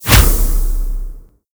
knife transform; netent

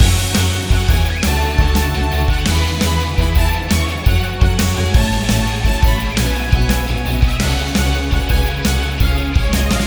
big Win Loop; netent

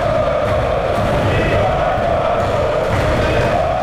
crowd loop Turkey; netent

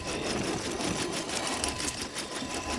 fs Reel Spin Loop; netent

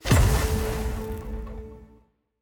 Scatter Hit; fruitblaze

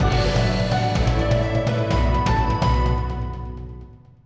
big win end; netent

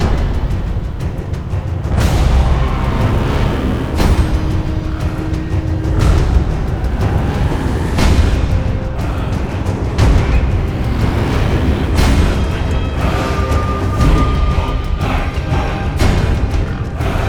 free Spin Amb; netent

